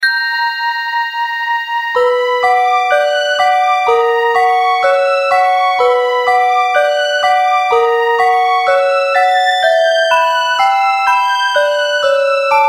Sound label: Scary tone